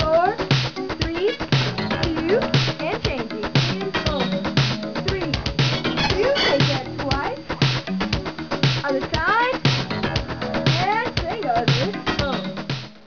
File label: aerobics